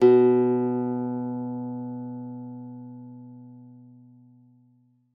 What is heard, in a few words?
B2, GUITAR; Xsample VOL-2